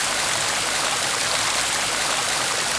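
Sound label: medium fountain; Star Wars Knights Of The Old Republic I; XBOX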